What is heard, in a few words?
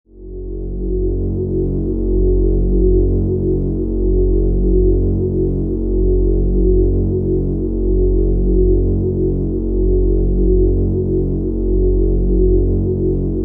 Spaceship, Spaceship: Ambience